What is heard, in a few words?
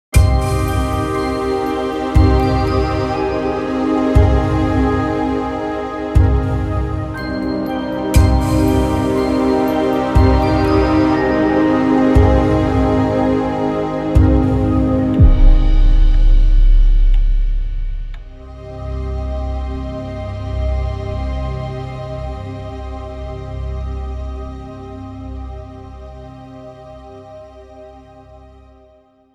FLOWERS FLUTES